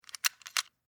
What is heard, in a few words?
Skeleton Key In, Insert, Into, Door, Lock; Foley; Jangling Sound Effects